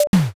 toaster

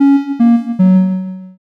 picosad

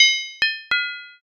metal drop

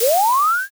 firework launch

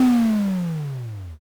dark magic

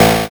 zap

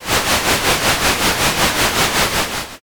comm noise

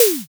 block break

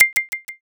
hyoshigi

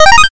power up

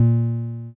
bass

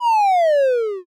sleep magic

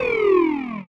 orc

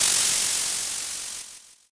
sparkler

